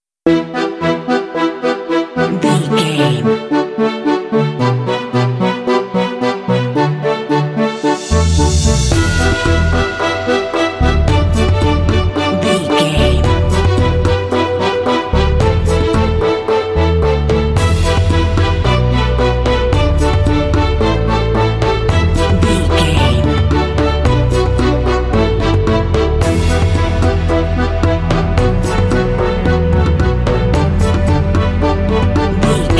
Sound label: violin tension dance